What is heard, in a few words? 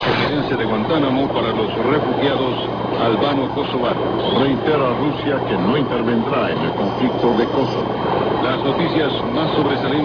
jammer 40m